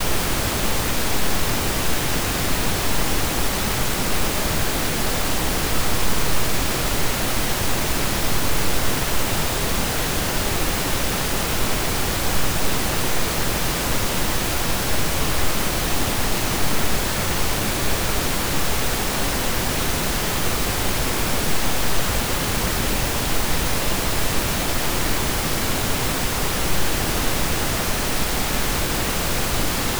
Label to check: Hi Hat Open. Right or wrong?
Wrong